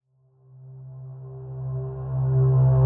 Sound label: Riser C; Overwhelm; Ghosthack